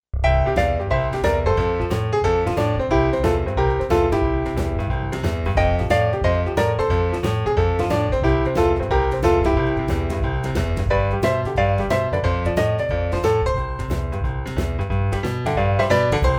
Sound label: boogie, piano